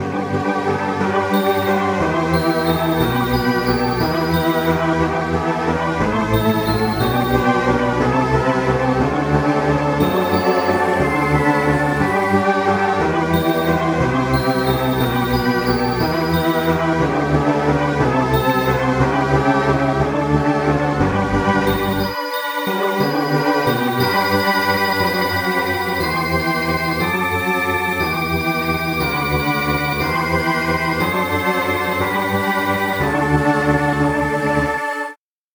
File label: goblin